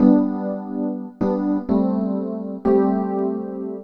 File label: DEEPHOUSE PIANO 125 A; Keys